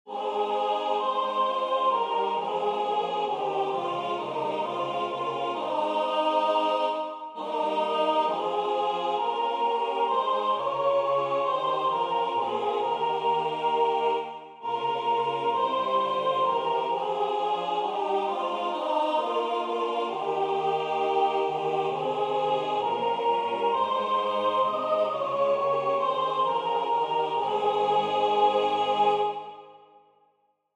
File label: SATB; wat vrolijk over u geschreven staat